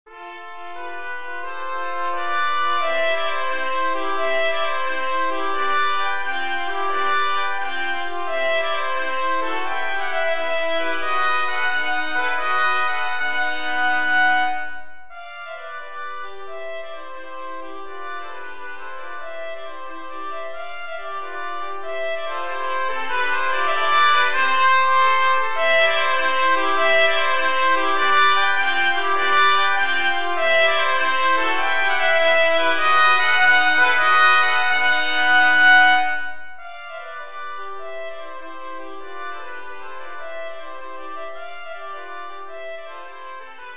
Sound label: trumpet trio